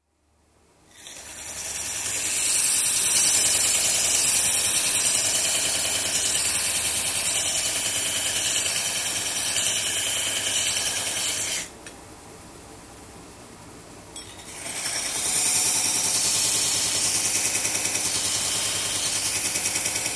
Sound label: Grinding, beveling on a grinding wheel; Tools; BBC